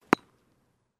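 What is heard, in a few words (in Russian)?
теннис мяч проскакивает мимо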